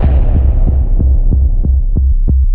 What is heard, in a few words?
construction sound; allegiance